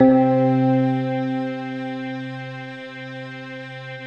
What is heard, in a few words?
Fender Violin, Fender Pad; Optical Media International; Sonic Images Library